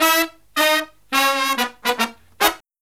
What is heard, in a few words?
Killer Brass Riffs, 108 soul